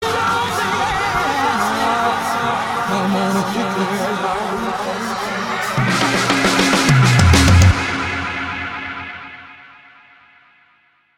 Dubstep Remix

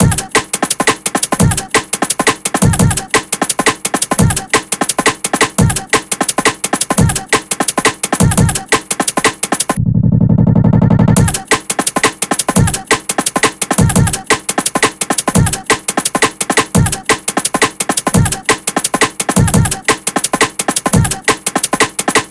172 kit head break no sidechain D#; ENEIV2

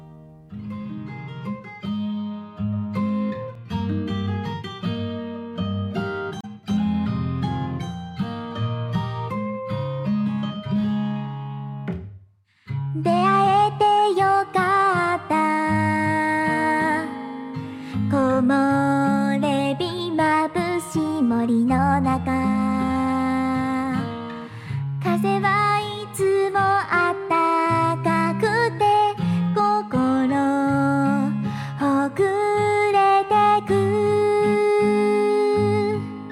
acoustic guitar

